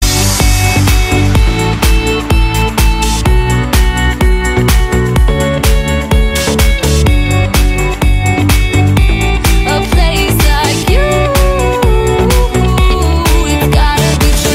electronic guitar tune ringtone

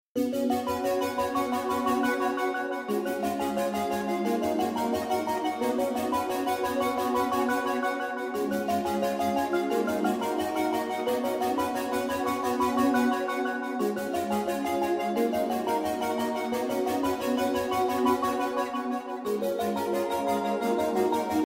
iphone 8 official ringtone

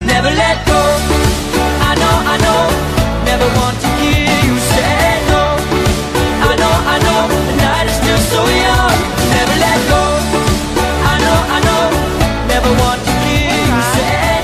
samsung vr ringtone

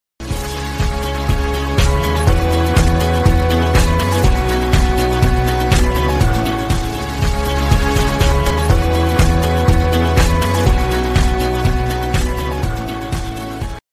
stars instrumental ringtone

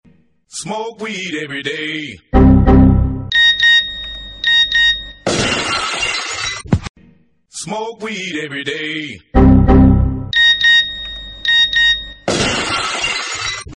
sms ringtone

